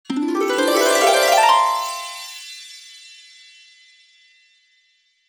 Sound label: Fairy godmother arrival